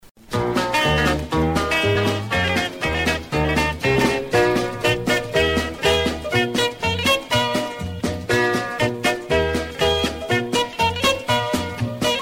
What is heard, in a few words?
Guitar